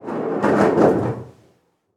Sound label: wood roll